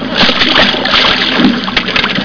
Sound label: splash